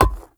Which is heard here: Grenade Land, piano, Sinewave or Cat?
Grenade Land